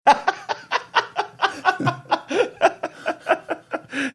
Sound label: laughing